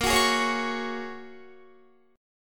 Bb Minor 7th Sharp 5th, Guitar; Bbm7#5 strummed

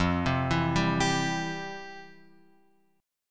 F Major 7th Suspended 2nd, F, guitar; FM7sus2 Chord {1 3 2 0 X 3} arpeggiated

